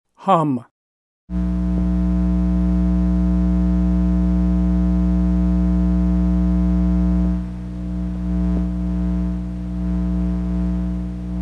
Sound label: hum